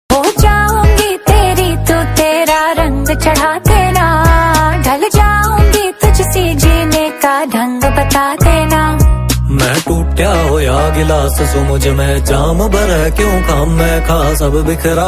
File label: Song Ringtone